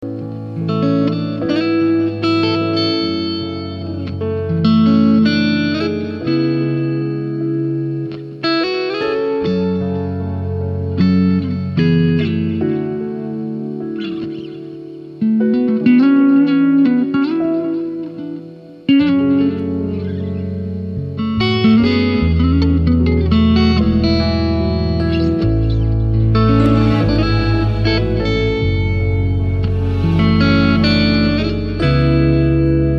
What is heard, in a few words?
clean tone